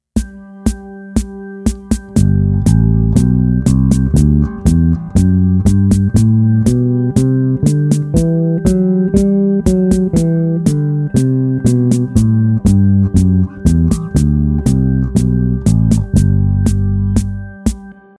Ionian Mode, Here’s the Ionian mode played against the G drone; bass; a bassist's guide to modes